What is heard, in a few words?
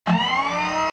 Hydraulics Engaged, Machines